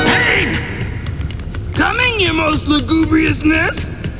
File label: pain